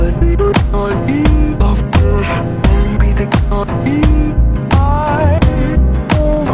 slowed down

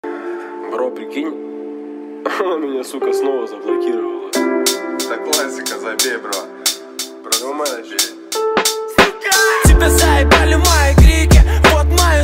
groove

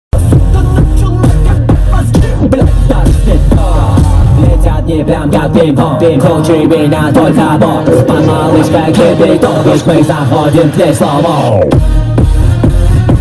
slow mo

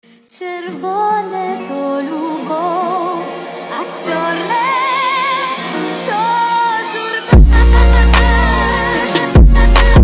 trap remix

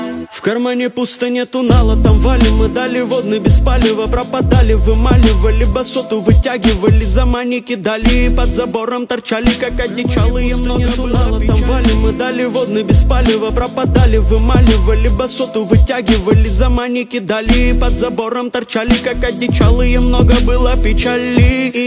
fart

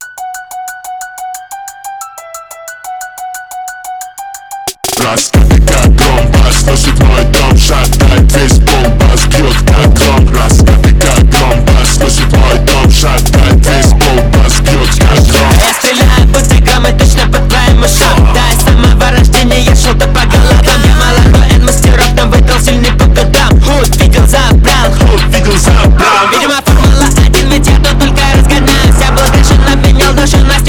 thunder phonk

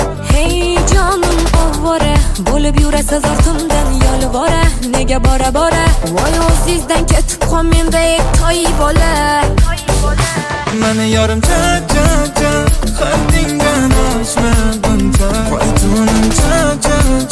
klap klap cha cha cha

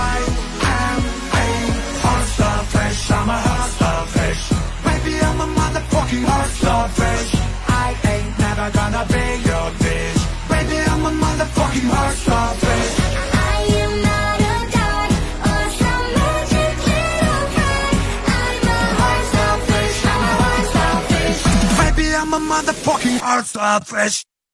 hardstyle

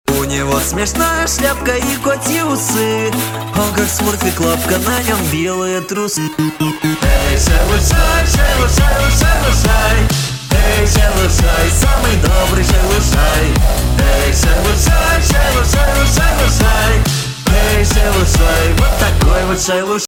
hardstyle remix

